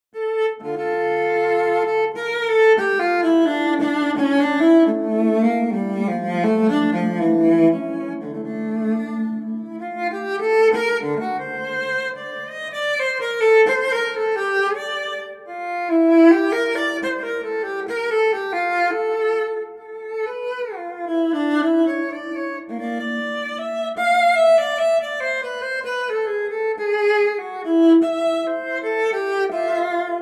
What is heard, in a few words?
Viola; SWAM